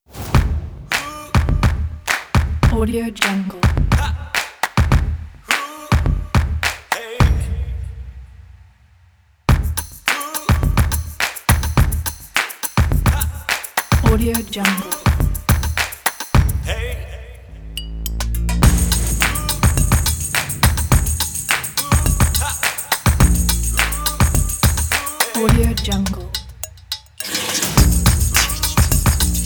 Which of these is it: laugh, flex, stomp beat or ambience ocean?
stomp beat